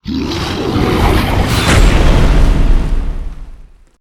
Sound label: atk, monster; arioch